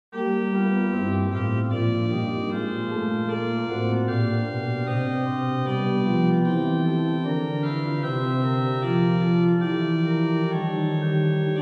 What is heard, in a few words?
Noel, Organ Music, Souvigny; Noel 11; Boely